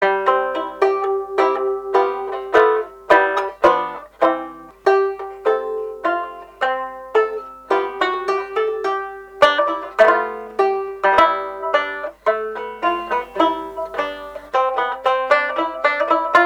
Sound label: Gavotte